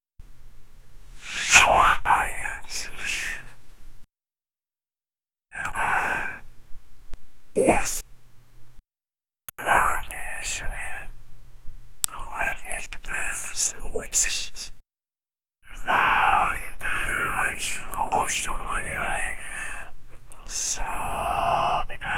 faint unholy whispers; supernatural

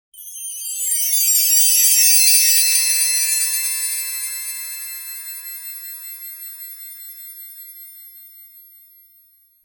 short magical sparkle sou, crystal chime

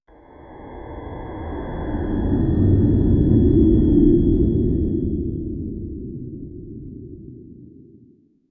a dark fog surrounds a, clearing

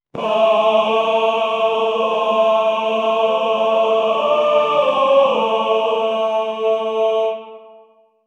gregorian man choir

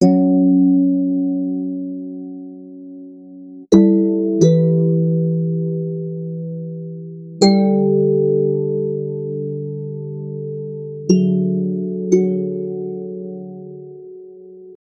design a soft soothing ri, ringtones

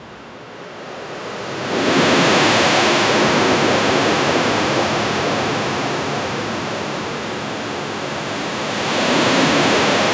a sea wave splashing that; burning

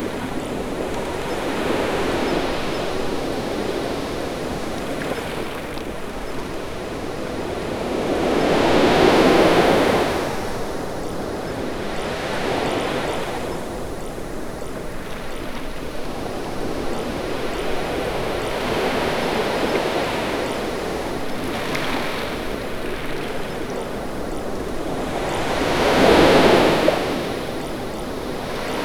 seamless loop of a luxury; high-end

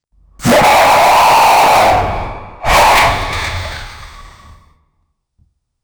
an alerted sound of some, infected

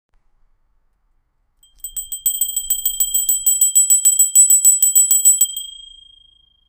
the sound of a bell; bells

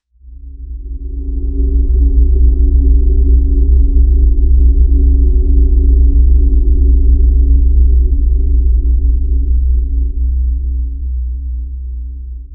futuristic sci-fi techno, drones

